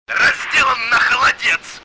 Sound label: knife kill